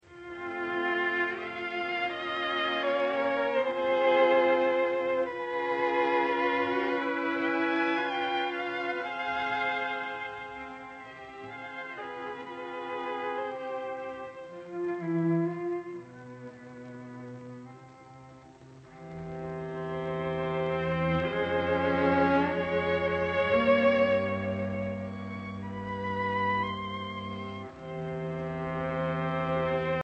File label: Larghetto espressivo Allegretto agitato Allegro